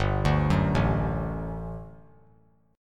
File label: G Major 7th Suspended 2nd G down; GM7sus2 arpeggiated